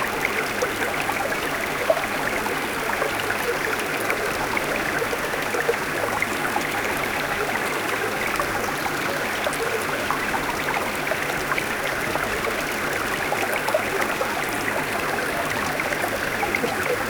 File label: detailed creek water asmr